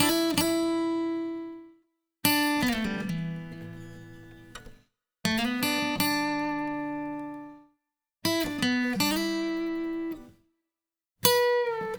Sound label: Acoustic Guitar Solo; Sonic Foundry (Sony Creative Software) - Continental Drift Aftershocks; Native American